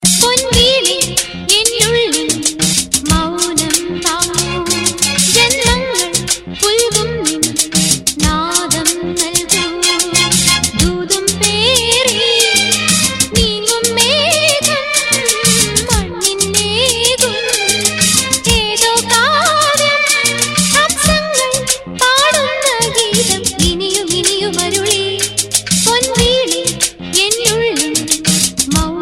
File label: malayalam song tone